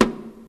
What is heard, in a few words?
snare sound a sharp key